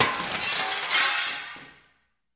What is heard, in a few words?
Glass Break Big; windows & mirrors